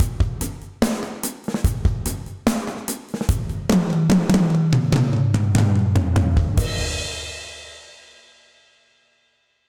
Bricks End Dark Plate Verb 73bpm, Session Drums Club; End2; Ableton; Factory Packs